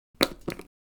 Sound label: Soap dispenser bathroom pushing or pressing